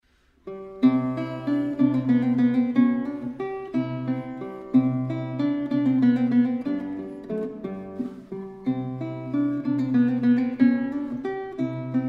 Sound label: guitar; Valtz In C Ms92; Paganini